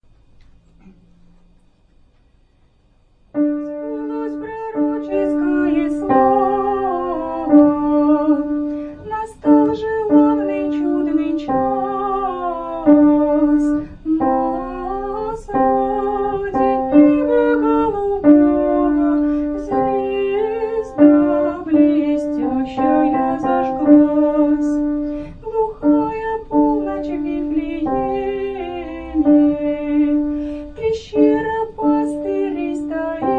piano; prophet word

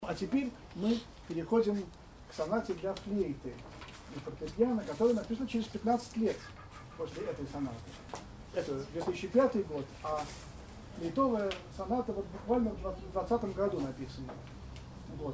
flute sonata

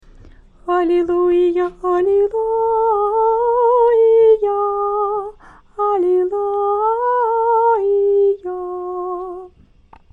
great akathistos halleluja, singing

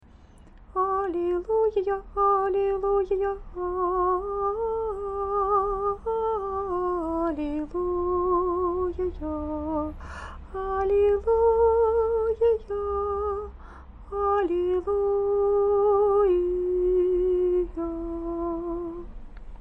halleluja, singing

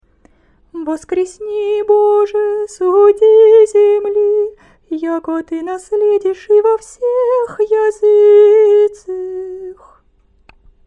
great saturday halleluja, singing

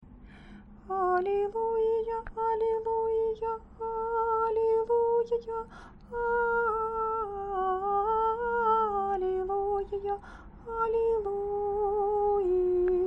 halleluja, singing

